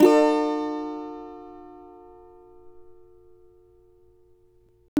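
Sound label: E minor, Guitars; Spectrasonics; Hans Zimmer Guitars VOL-2; CAVA CHORDS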